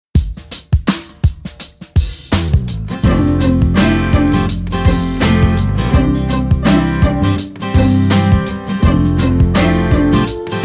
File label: Up Tempo Female Pop Breakup Song